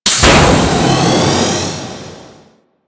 Escape Pod Start; Ambience; Warframe; Corpus Infested

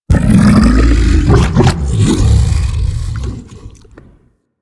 Feed React Happy; Helminth; Warframe; Hub; Liset Alchemy Lab

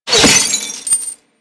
Glass Impact; Warframe

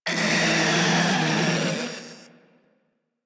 Proto Infested Ancient Death, Enemies Vocals

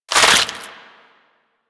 Tendrils Burst; Warframe; Warframes

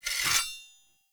Short Sword Equip, Enemy Swords; Weapons; Sinecure Short Sword

